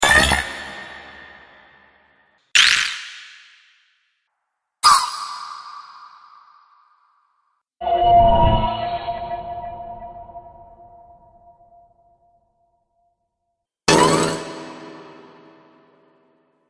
Sci fi Sounds; 5 Sci-Fi Sounds - Production Pack 2

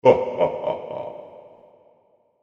Tyrant Laughter, evil laugh; fantasy sound effect

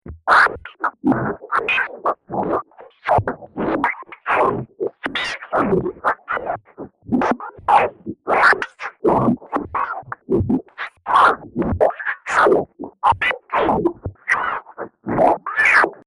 Crackle And Pop Chaos 120Bpm Loop; Magic Sound Effects; Looping Sound Effect

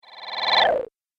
Magic Power Down; Magic Sound Effects

